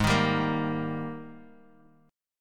G sharp Major 7th Suspended 2nd, Guitar, Half Step Down